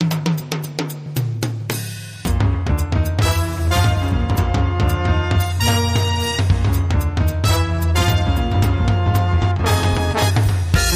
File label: Jump Guitar Swing